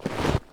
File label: snow footstep; minetest; pedology